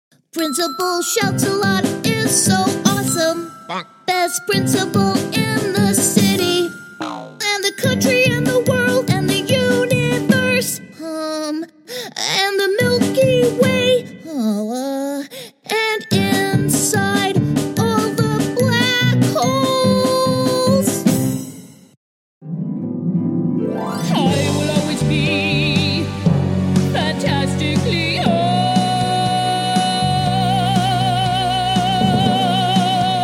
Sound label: Animation Singing Reel